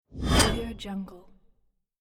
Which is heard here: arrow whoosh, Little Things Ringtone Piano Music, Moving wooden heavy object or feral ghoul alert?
arrow whoosh